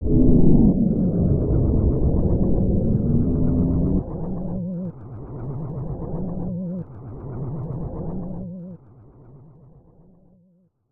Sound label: Alien's Roar; Metal Slader Glory Director's Cut; snes